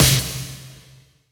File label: Claps & Snares